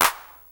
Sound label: Clap, Drum Percussion Single Hits